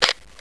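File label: Voigtlander Bessamatic SLR, camera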